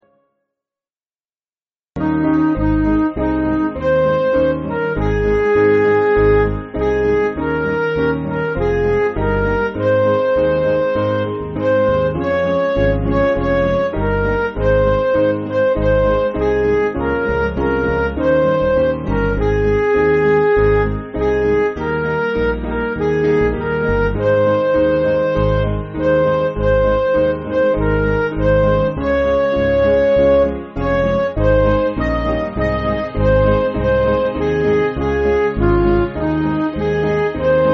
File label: Midi